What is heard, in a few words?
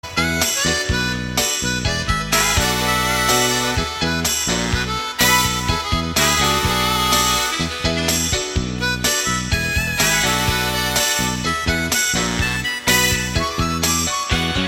fake harmonica